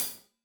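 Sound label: hat closed, hi-hat cymbals stereo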